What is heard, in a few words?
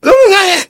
engineer pain severe